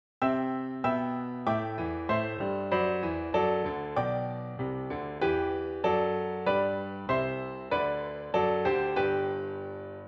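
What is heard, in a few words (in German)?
Klavier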